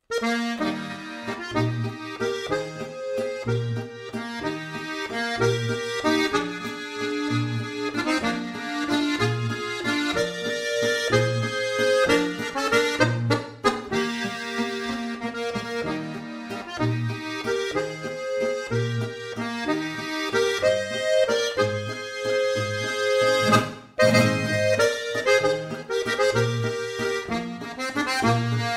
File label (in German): volksmusikstueckl